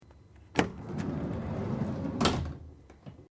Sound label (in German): schiebetuer Lieferwagen oeffnen